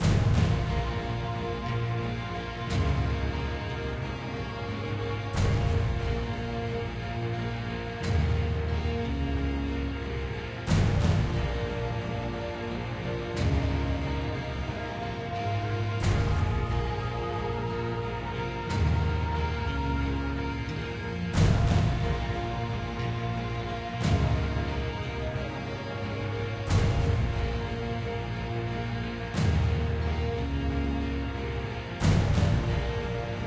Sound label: Tension High; Great Wall